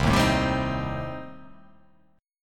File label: C Minor 6th C